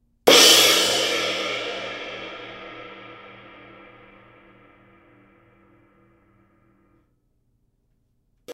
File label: K Constantinople Renaissance Ride, cymbal